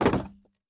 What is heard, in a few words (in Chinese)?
竹木门开关声